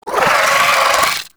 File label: Vocal Attack; Warframe; Moa Satyr